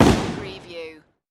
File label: Shotgun Single Shot; sound effects bible